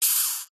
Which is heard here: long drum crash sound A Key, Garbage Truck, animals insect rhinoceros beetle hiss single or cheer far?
animals insect rhinoceros beetle hiss single